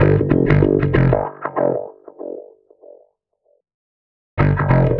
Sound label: Bass